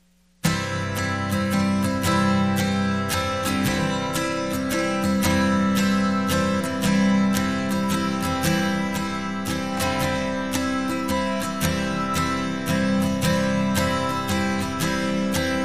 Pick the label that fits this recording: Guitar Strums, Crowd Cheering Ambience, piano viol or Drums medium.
Guitar Strums